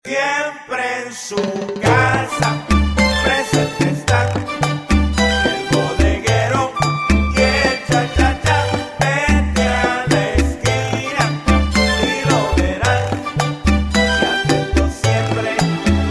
Latin Band, cha cha

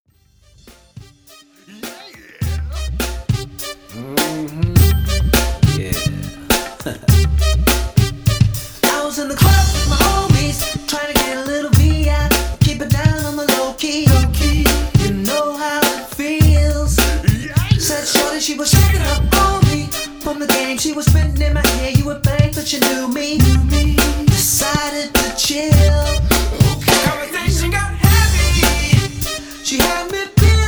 Party Band; Sacramento

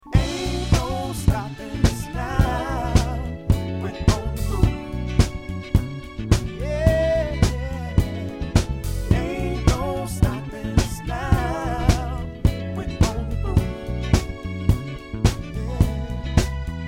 Pop Band; New York Pop Band

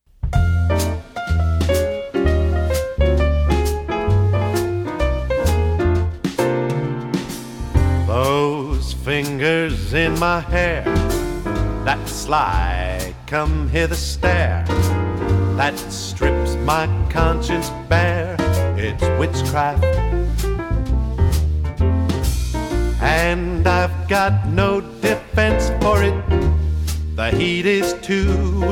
Jazz Singer Trio; New York Jazz Singer

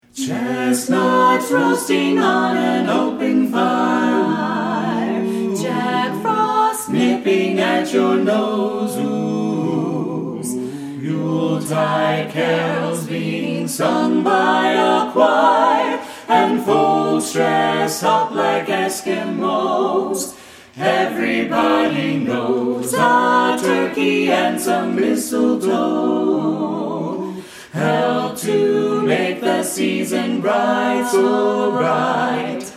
Carolers Christmas Song

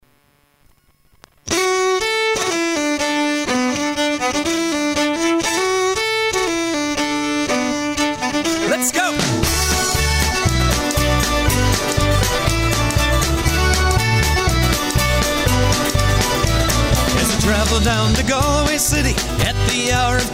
Irish Band; Washington DC

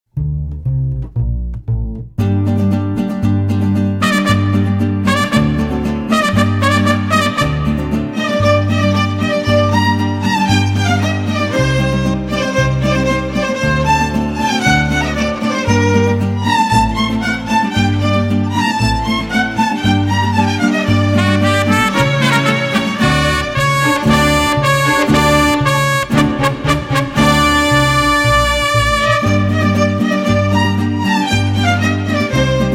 Mariachi; San Francisco Mariachi

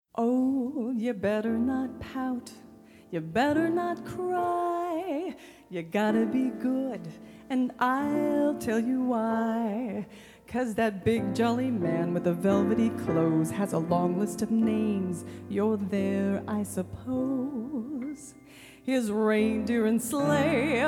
Christmas Trio; Seattle

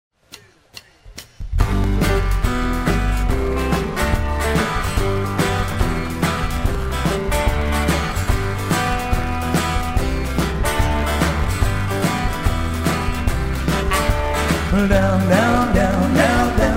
Country Singer; Los Angeles

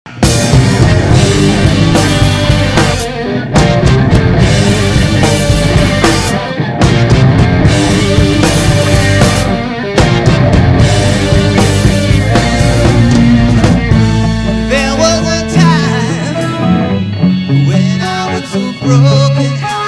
Tribute Band

